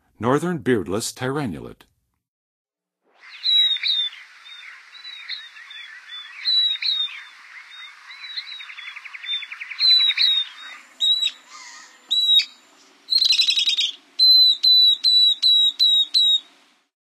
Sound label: Northern Beardless-tyrannulet, Songs of Birds; North America; Western USA; Western USA Songbirds